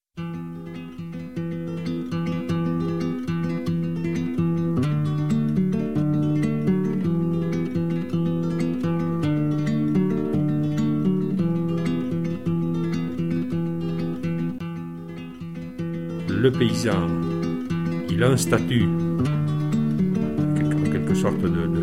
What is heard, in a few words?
jingle